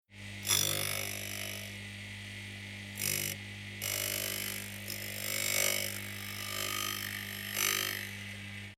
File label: Industrial Bench Metal Grinder Run Grinding Light High Pitched Various; Grinder Sound Effects